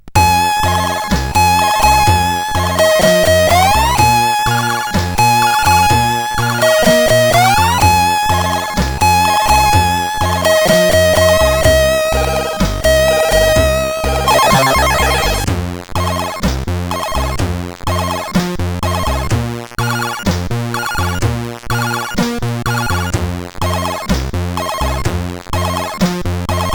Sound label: Sample, CSG8580R5